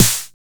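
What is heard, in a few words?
909 DEEP SN, TR909 snare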